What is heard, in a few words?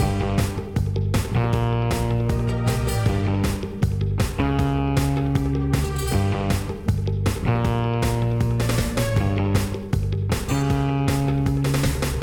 Tornado Platforming MIXED; Ben 10